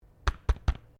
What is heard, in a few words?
PEPPER GRINDER TAP; Various kitchen appliances; Kitchen (Sound Effects:Household)